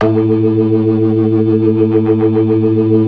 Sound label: ORGAN, 4 DRAWBARS; Keith Emerson Signature Series